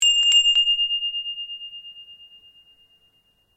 Bell Handbell Priest Japanese Ring Multiple, Japanese priest bell; Bells; Musical; SoundEffects-Foundation1